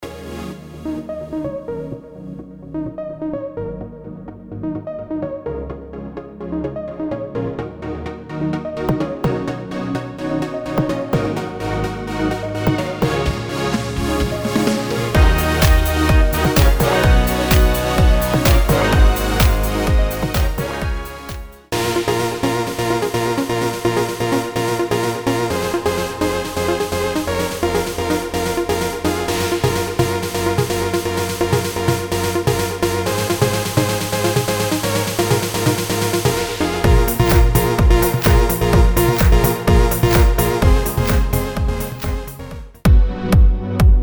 house

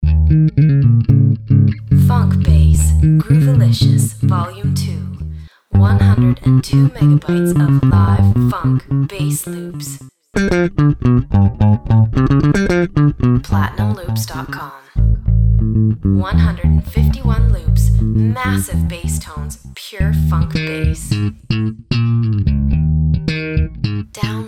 funk bass groovalicious

